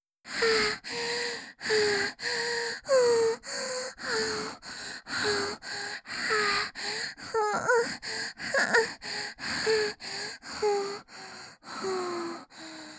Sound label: High anime moans BREATHING